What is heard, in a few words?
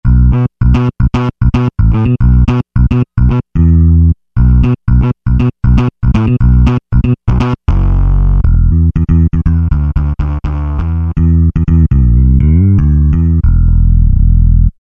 W Shape Bass, Roland V-Synth; Factory Patches